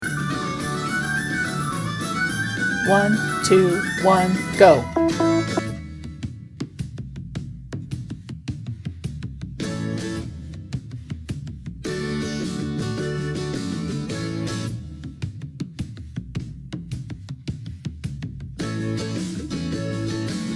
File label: heave away original key performance; auditions; keyano theatre